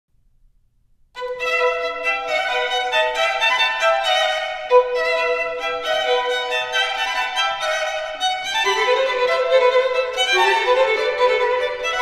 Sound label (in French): duo deux violons extrait court